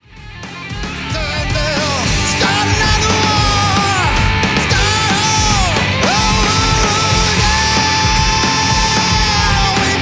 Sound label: Alt Rock Mixing